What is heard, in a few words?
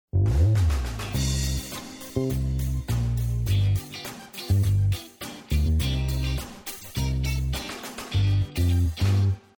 match bgm